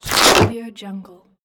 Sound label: inflating the balloon